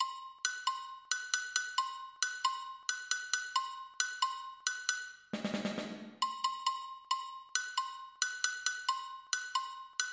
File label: break, samba